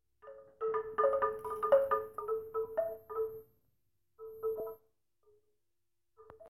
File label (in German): Windspiel